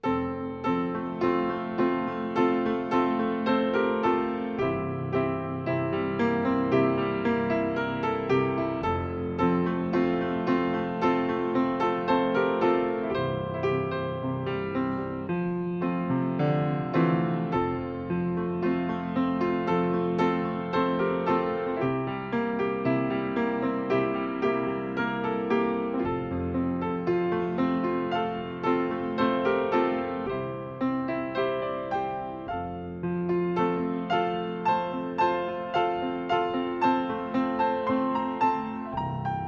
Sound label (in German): Vorspiel